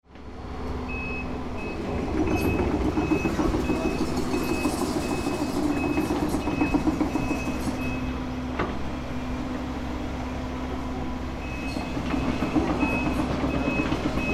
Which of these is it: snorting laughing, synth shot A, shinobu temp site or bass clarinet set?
shinobu temp site